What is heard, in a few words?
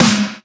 Snare; FLPRO